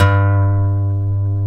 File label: A, funk guitars